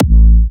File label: Slobber, Big Room Kicks